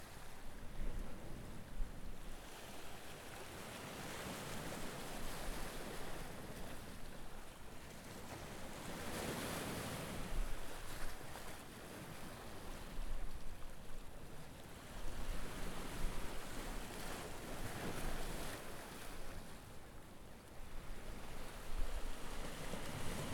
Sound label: Waves Barcelona Port